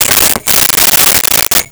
Rotary Telephone Dial; Communications

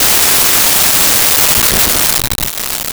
Smash Glass

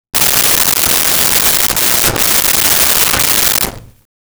Silverware Drawer Sort Through, Kitchen

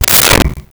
Close Microwave Door; Appliances

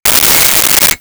Metal Slide

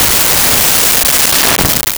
Large Caliber Hand Gun

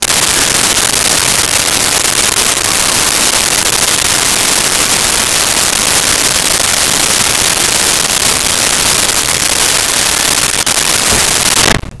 Garage Door Close; Automobiles

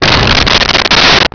impact meteor fall

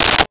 Telephone Rotary Receiver Down; Communications; 1001 Sound Effects

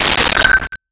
Glasses Hit and Ring; Crashes

